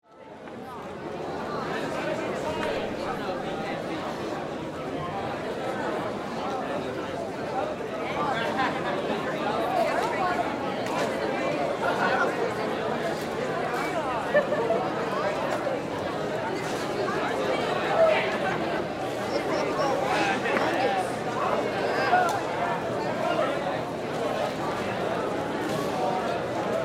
High School Cafeteria, Ambience, High School, Cafeteria; Schools; Blastwave